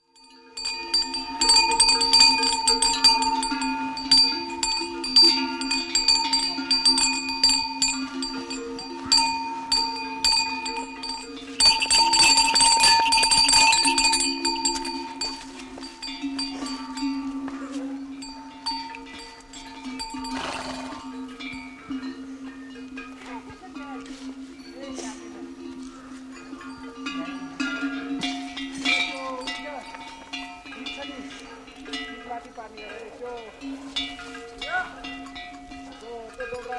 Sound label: Mules with bells and minders chatting and shouting, preparing animals for journey, Himalayas, near Annapurna, Nepal; Donkey; BBC